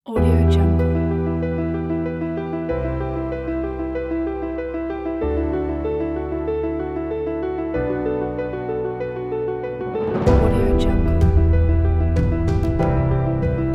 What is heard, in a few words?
grand epic cinematic trailer